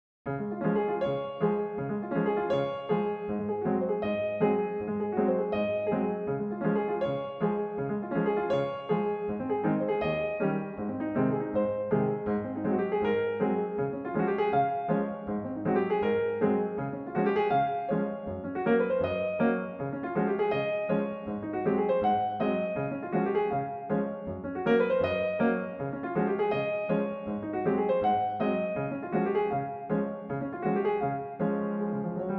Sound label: Piano